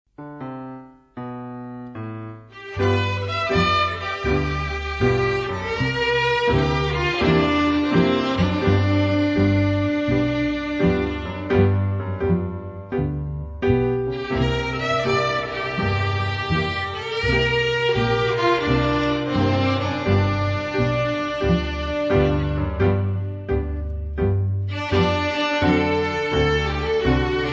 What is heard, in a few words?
Slow Blues in G